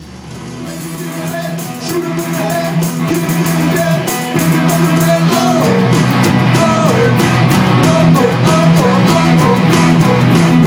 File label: Bonus Level; Threat Level Ultra